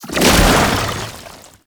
Tank Infested Melee Charge; Enemies; Abilities; Lasrian Tank; Warframe

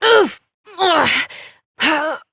Death, Adult; New War; Operator

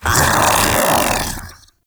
Infested Splitter Joined Vocal Attack Medium; Grey Strain

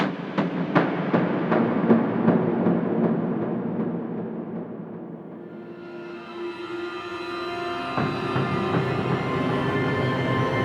Hubs Amb Cue A; Syndicate Red Veil; Tenno Hubs Red Veil

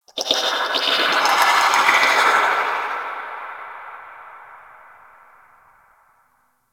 Misc One Off, Ambience; Warframe; Tenno; Sector Wars

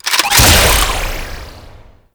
Trapper Drone Fire Start; Warframe; Tau; Prime Grineer

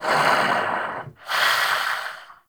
Vocal Breathe; Warframe; Duviri; Dax

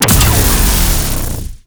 Shield Down; Warframe; Captain Vor; Abilities Redux

